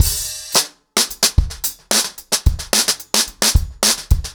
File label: 110BPM, acoustic drums, drumloops